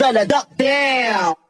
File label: duh duh duh dow; ooh ahh